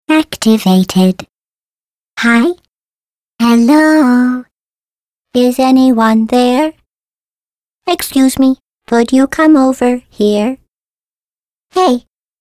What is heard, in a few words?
portal turret ringtone